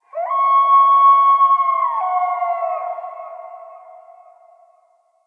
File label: Wolf Cub Trapped Call Distant; Animals; Wolf Cubs; Orengal Wolf Cub B